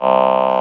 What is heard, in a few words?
vocoders; Sound & Vision - Gigapack I CD 2